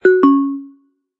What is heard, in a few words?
end of call